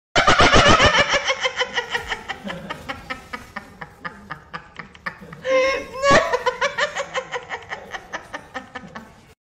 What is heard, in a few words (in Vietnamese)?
cười hahahahaha